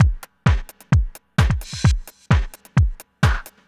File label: dubby house 130